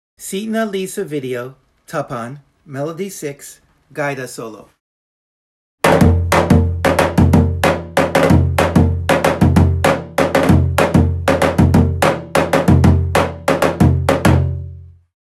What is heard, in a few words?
tapan melody